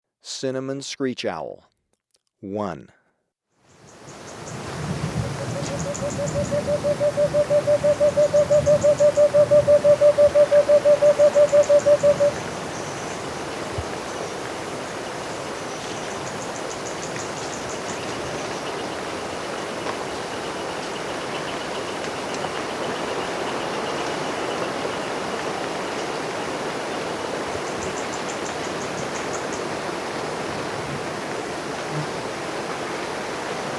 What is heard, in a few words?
Cinnamon Screech-Owl, Birds of Ecuador; S. America